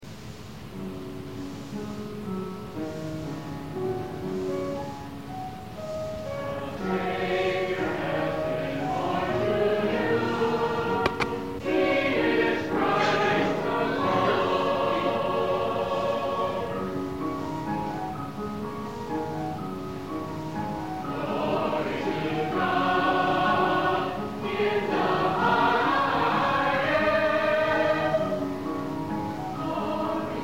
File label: choir; Christmas; Glory Grace; Christmas 2000